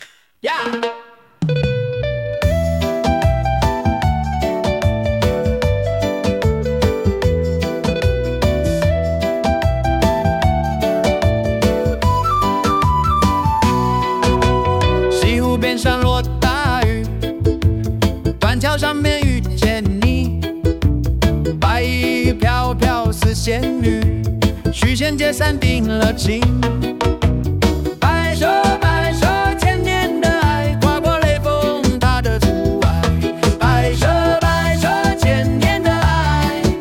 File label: reggae